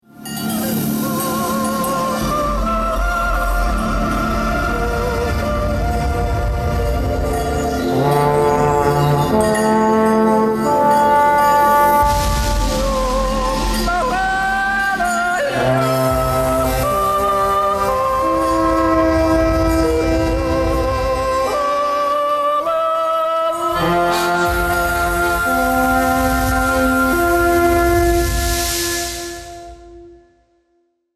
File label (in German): solo